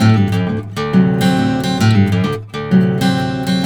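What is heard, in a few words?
baritone riff, Blues Guitar; ILIO; Fretworks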